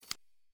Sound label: Lock; Coolpad ivvi K1 mini